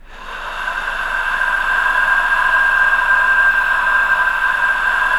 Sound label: NOISEBED, VOCAL WIND; ILIO; Vocal Planet; Jazz & FX